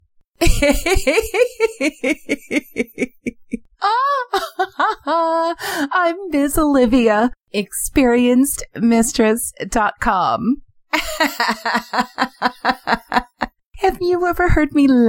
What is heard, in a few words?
laughter